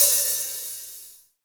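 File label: hi hat; Best Service Gigapack 2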